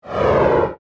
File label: Whoosh Short, Whoosh, Short, Phase, Production Element, Imaging Element, Accent, Transition; BlastWave